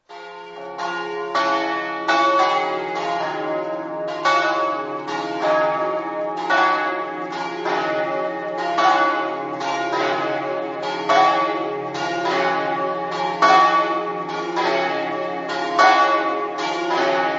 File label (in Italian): camino, campane